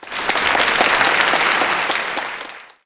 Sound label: Crowd Applaud